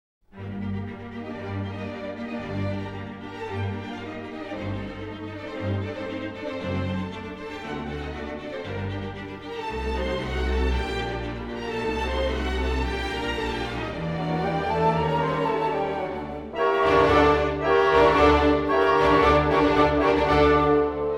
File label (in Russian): Simfonija N 40 Sol-Minor Allegr